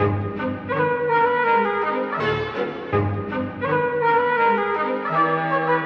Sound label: strings sneaky elves; Loops; Phonky